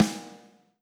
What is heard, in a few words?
soft hit clap